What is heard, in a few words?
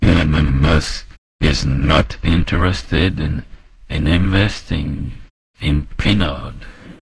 jingle